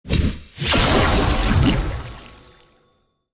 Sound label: base attack; Idle Champions of the Forgotten Realms; makos